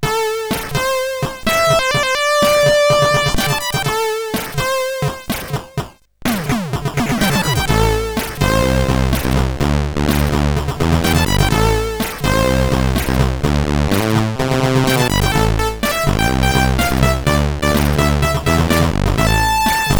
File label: Jingles sid CSG8580R5